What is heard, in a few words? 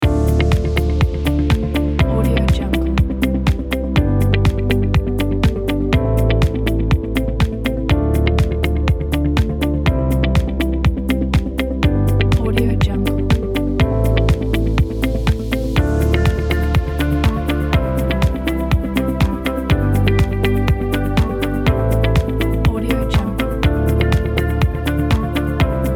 inspiring loop